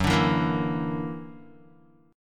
Gb Augmented 7th Gb down Guitar 4E,4A,5D,5G,5C,6F; Gb+7 strummed

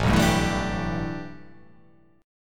A 7th Suspended 2nd Suspended 4th

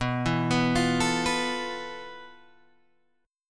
F Major 7th Flat 5th B, guitar chord

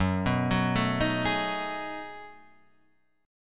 B 13th Gb, chord

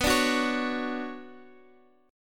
B Minor Add 9th B, chord; Bmadd9 strummed; madd9:1,b3,(5),9; JGuitar

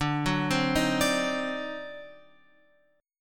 D 7th Flat 9th D down Guitar Open F; D7b9; arpeggiated

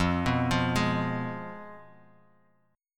F Diminished, Guitar, Standard; arpeggiated; 1,2,3,1,x,x; JGuitar

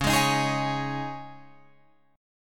Db Suspended 2nd, Guitar, Open F, Dbsus2 chord; strummed; JGuitar

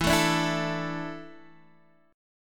E 7th Suspended 4th; E7sus4; strummed; x,7,7,7,5,5

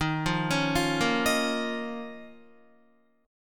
Eb 7th Suspended 2nd, Guitar; arpeggiated; chord

